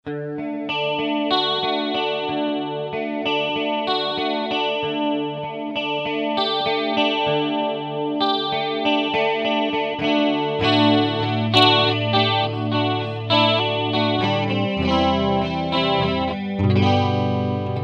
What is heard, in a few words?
CLASSIC CLEAN, Digitech RP200; Factory Presets